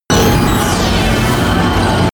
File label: space passing fighting racer; raumschiff